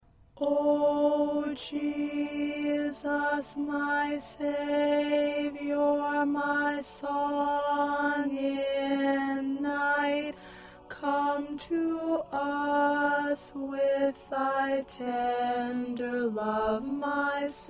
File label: Alto, choir parts